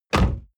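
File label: sports car exterior door close hard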